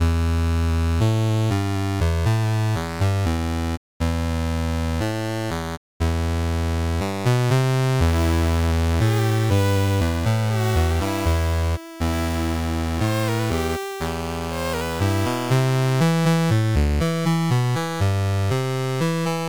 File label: youthful elf in the dark world chiptune; Fakebit / Chiptune Music Pack